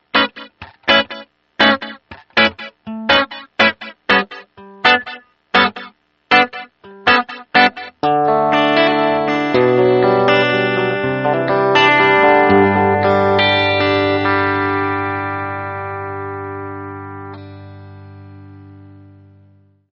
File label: symph delay